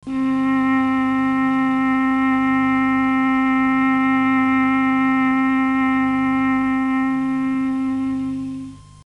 m300 clarinet

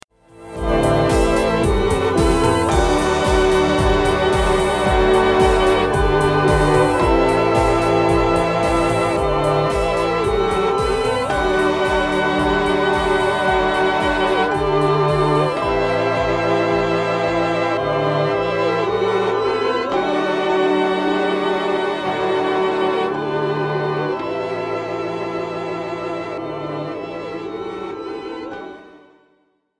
chamberlin violin

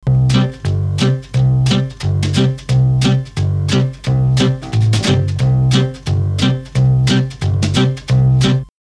quickstep, mellotron m300

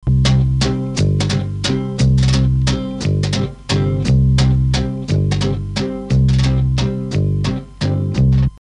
vienese waltz

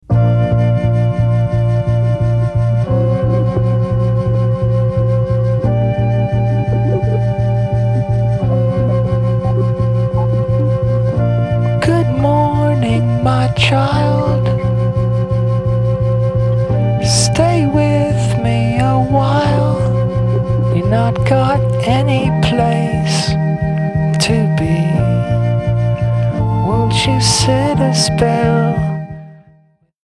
chamberlin flute

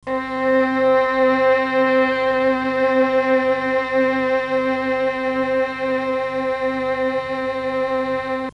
m300 violins

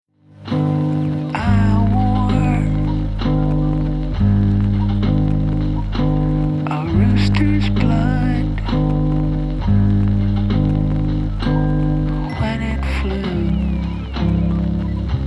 optigan

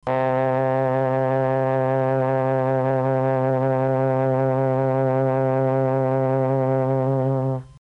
m300 trombone

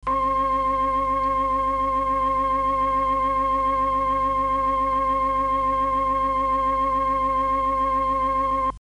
organ C4

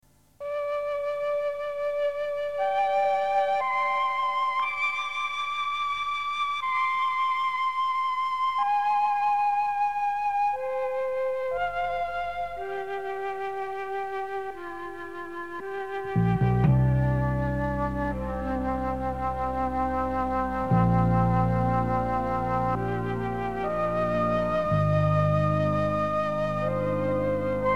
drum folk, mellotron

